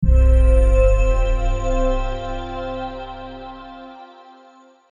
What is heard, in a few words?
Main Menu Appear; slingo-adventure